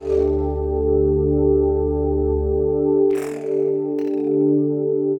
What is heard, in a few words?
Pad Soul Chords, Drum N Bass; Keys; Sonic Foundry (Sony Creative Software); Knutrix Drum & Bass